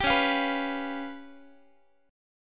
C sharp 7th Flat 5th Guitar, chord; C#7b5; strummed; tuning=4A,4E,5A,5D,5G#,6C#

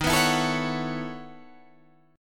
B 11th D sharp, chord

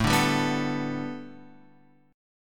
A 7th Suspended 2nd Suspended 4th Guitar Open F; A7sus2sus4 strummed

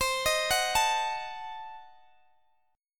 C Diminished 7th, Guitar DADGAD, chord

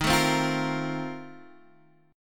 F 11th D sharp, chord

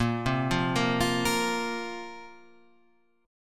A sharp Suspended 2nd down Guitar Half Step Down

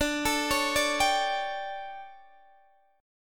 D Major 7th Suspended 4th, Guitar, Open D

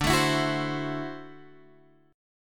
D 9th D, guitar; D9 Chord {10 X 7 9 7 8} strummed

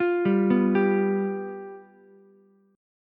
F Suspended 2nd Flat 5th Ukulele, chord; arpeggiated; Fsus2b5; 10,7,7,10

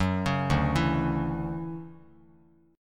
D-Major 9th D down Bass Standard; DM9; arpeggiated; JGuitar

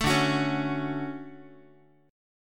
D Major 7th, Guitar; DM7 strummed

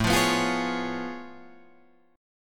A Augmented Major 9th A down Guitar Drop D

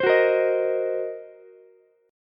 Gb 6th Flat 5th Gb down Ukulele; strummed; Gb6b5; JGuitar

